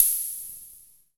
EH16 CYM, Electro-Harmonix DRM-16

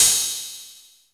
Ride Elektro, Korg Radias

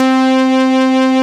ARP BASS; AKAI S-Series CD-ROM Sound Library VOL-8

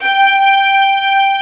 VIOLIN G4; STR

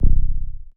SNGL.BASS, Jungle Rave; Best Service Dance Mega Jungle Rave

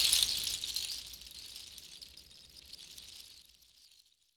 Sea Shells Loop, Percussion, Shakers; Roland BK-5

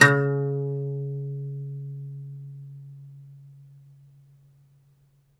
OUD C#2, Plucked Strings; E-MU Producer Series Vol. 7 – Old World Instruments

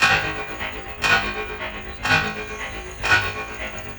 Rhythmic (120BPM); musicradar

